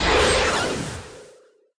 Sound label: Jump